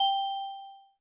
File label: Key